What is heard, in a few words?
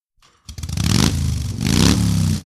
Motorcycle